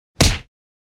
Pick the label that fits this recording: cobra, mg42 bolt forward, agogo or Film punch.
Film punch